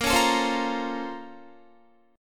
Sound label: Bb Minor Major 9th, Guitar; BbmM9; strummed; JGuitar